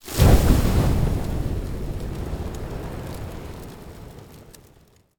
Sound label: Arrow Launch Fire; SteamVR; InteractionSystem; Longbow